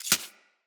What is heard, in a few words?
Arachnoid Micro Step Snow; Enemies; Corpus